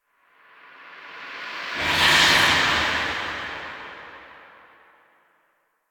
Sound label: Queens Mind Burrow Exit; The War Within